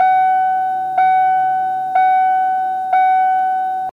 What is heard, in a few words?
Car Chirp Loop